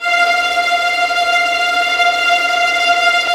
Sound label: STR TREM, Vlns Tremolo; Roland - String Master Series